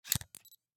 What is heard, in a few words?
Camera, Pentax KS2, DSLR, electronic, mechanical, ready shot, flash up, focus, quick; Eiravaein Works; Vaeyan IV